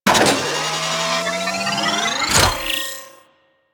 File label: Crp Ship Rolo Dex Open C; Ambience; Props; Warframe; Corpus Ship Remaster